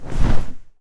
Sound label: futon dive; easyrpg; WitchsHeart